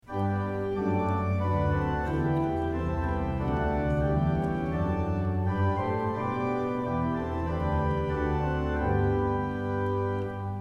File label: hymn